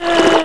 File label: WATER D, waves; mordor